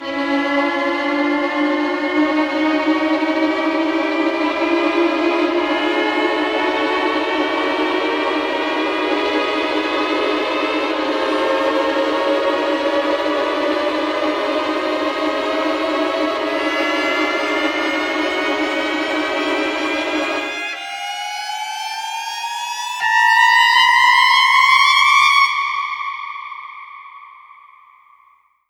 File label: Horror Suspense Build